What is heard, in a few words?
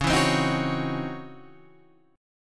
Db Minor Major 11th Db Guitar Standard; DbmM11 strummed; JGuitar